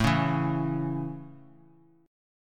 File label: A Major A; strummed